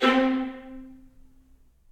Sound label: Strings Ensemble Staccato; Ableton; Orchestral Strings